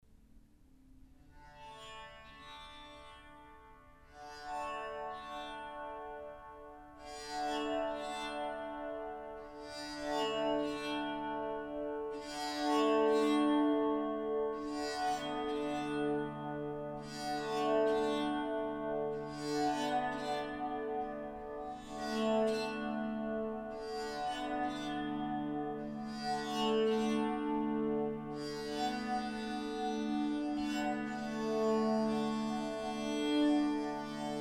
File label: chestnut bari sound, bowed psaltery, Baritone